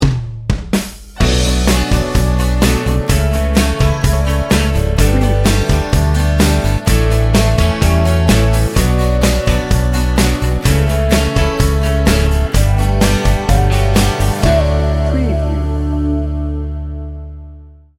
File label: country friendly